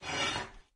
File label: Grab Shovel; Lethal Company; Windows